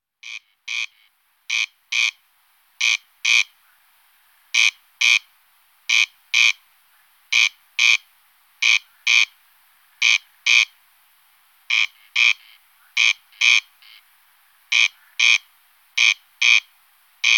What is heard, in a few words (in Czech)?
Chrastal polni Crex crex